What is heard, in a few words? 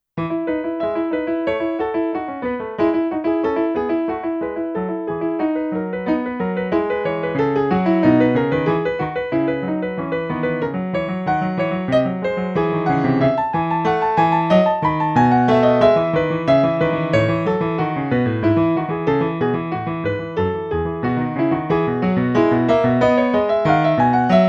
bach prelude in e major; bwv 937